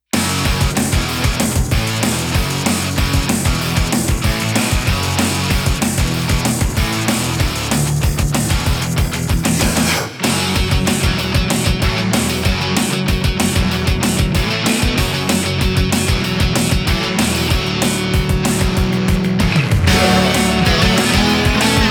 Level Forest Loop; running gods

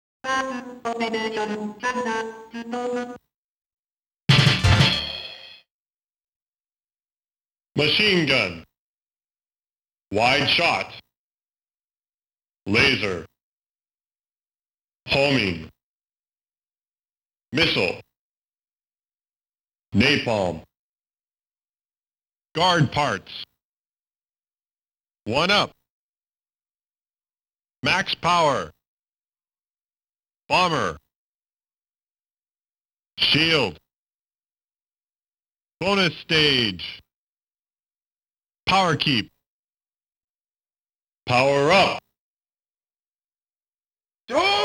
S.E. & VOICE COLLECTION; Varth Operation Thunder Storm; G.S.M. Capcom 6

